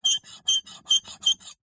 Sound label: knife tip scrape scratch metal screech piercing fast